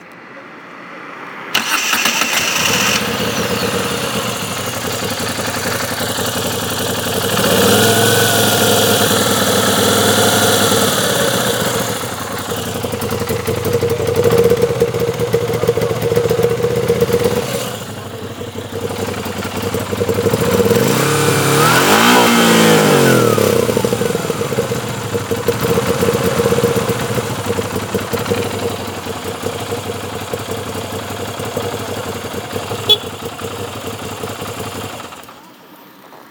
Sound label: Guzzi V7 II